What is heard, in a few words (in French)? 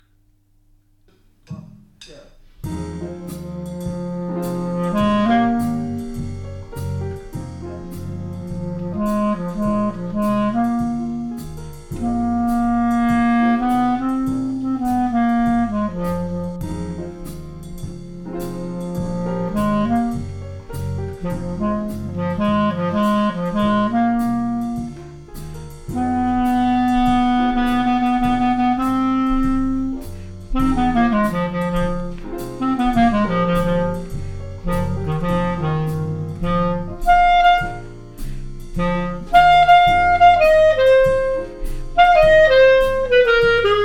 Impro 3 notes